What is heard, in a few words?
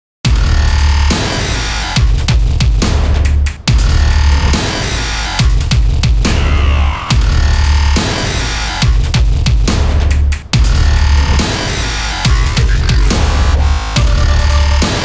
DUB